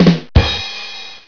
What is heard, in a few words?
Rimshot; Lasso 2.5 Server